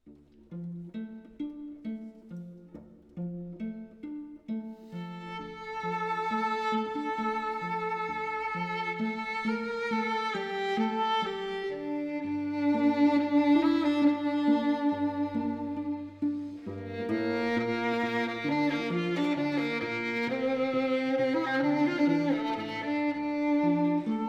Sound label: smychkovyy ansambl